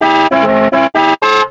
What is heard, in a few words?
horn; TrackMania United; Skins